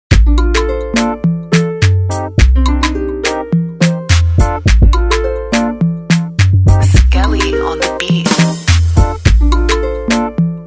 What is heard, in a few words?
Caterpillar Instrumental